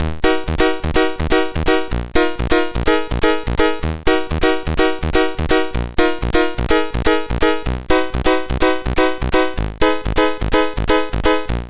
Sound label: Piano Work, sid MOS6581R4; hvsc